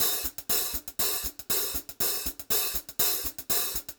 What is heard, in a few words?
FUNKY, Funk Groove Drumloops; USB Soundscan vol.38; AKAI; 90 s Sample CDs; 120 FUNKY